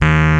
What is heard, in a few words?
RHODES D#4; E-MU Formula 4000 Series Vol. 3 – Analog Odyssey; VK Instruments